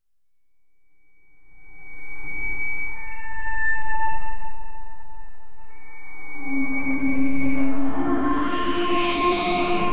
suspense and thriller

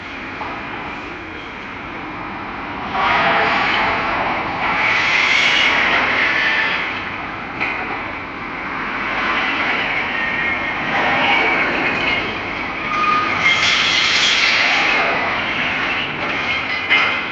building collapsing; demolition

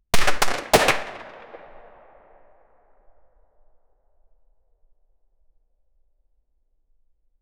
single sp5a3 9mm gunshot

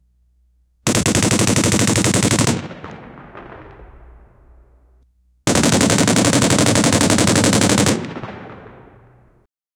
pkm machine, machine gun

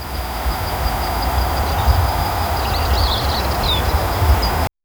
a warm sweeping breeze ro

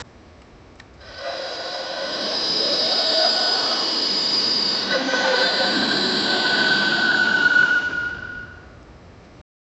effort

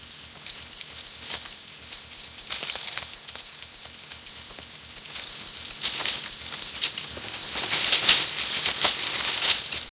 loud tree snapping and, branches

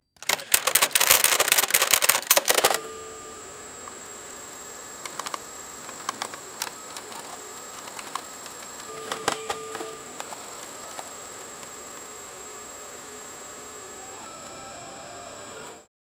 casino

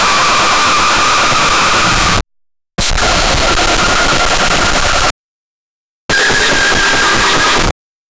shocked

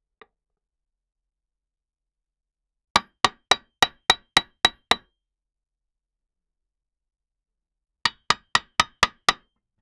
hammer hitting something, construction

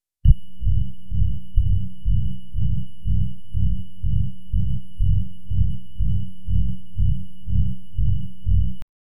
a rising digital hum

